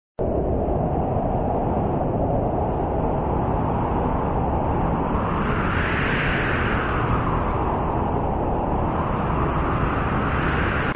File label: wind mono; Contest Sound-FX folders; Modular Sound-FX Folder